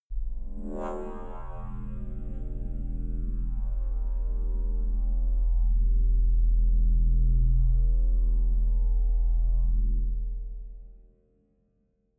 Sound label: crushed bass growl soft; horror